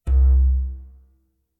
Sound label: Monitor Middle